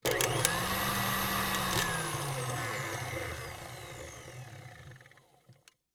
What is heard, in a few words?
Stand mixer, professional, multi speed, no bowl, ramp up, power down; Eiravaein Works; Vaeyan IV